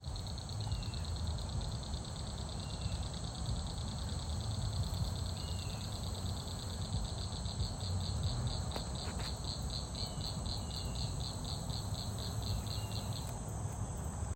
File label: frog or cricket with background birds; field recordings; scout island